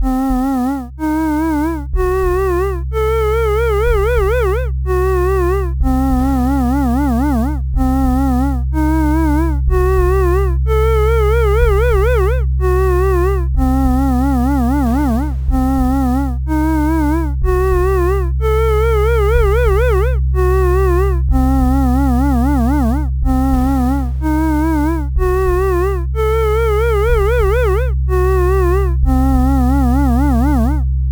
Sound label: dark sirena